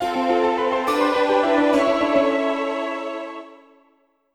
Harp; Brandish 4; SC-88Pro

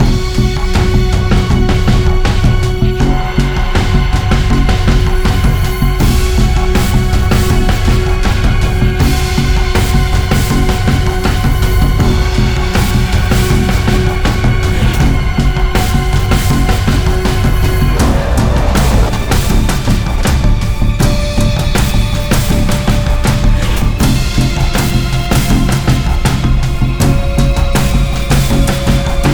Musik Cristal Fight

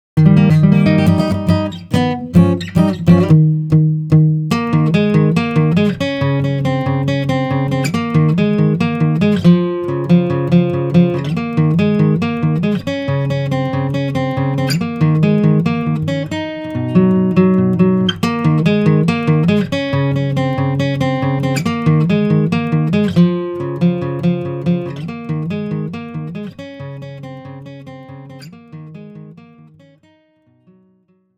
victory; guitar solo; best of final fantasy

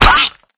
Piggy Tap; Clickergame

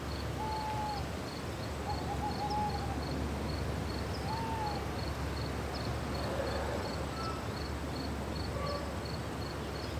Town park; Tokimeki Idol

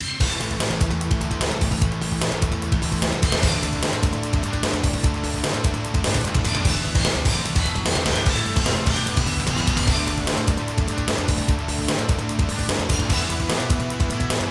Continue; Street Fighter The Movie

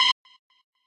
Beep OK

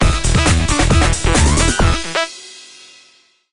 victory; metaloid origin; xbox series xs

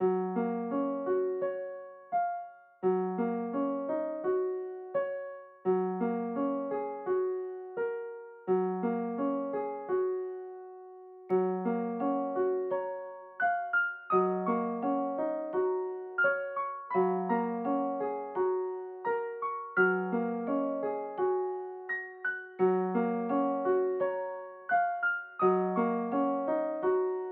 menu piano; inbento

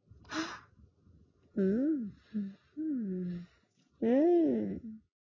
Wardrobe

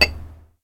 Impact glass medium; Puddle; puddle plus ios gamerip 2018

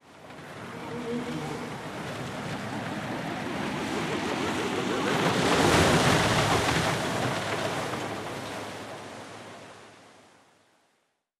Naval Battle

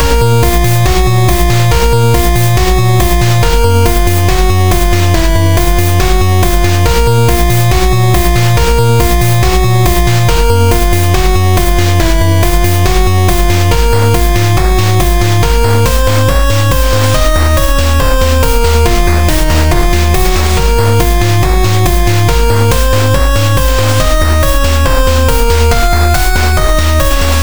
Dark Tune; Adventure in the Tower of Flight

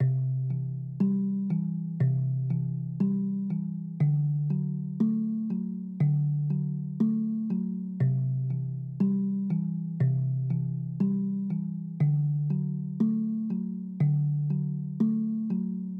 Garden Loop Harp; BouncyBoi in Puzzle Land

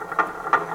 Platform move gear

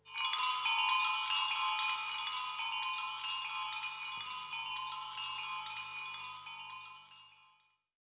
Music Effect Bells; Nosferatu The Wrath of Malachi

